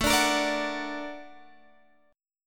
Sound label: Bb Augmented Major 7th A